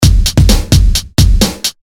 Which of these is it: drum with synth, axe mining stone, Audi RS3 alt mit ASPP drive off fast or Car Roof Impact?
drum with synth